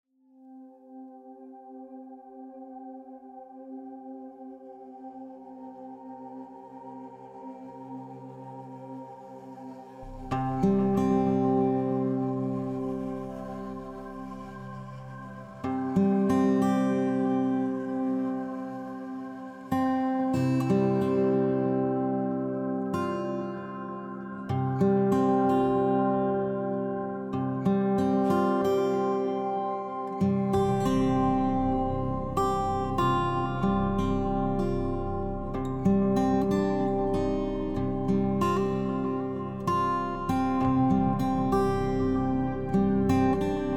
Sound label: Exploration Mesa Minimal Guitar pick; Horizon Zero Dawn; PS4